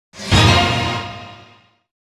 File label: Unknown Fanfare; Super Smash Bros Brawl